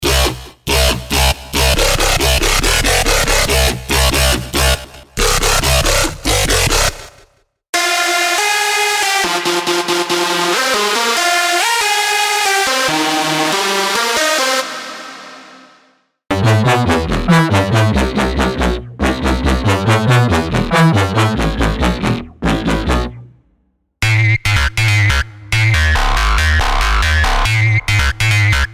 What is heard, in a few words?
Melodies Dubstep DnB